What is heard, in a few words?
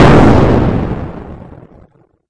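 big explosion; VIDEO GAMES; solarq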